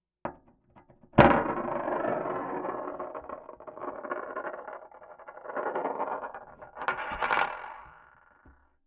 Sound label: Spin Test